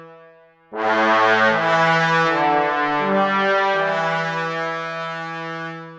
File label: Trombones; тромбон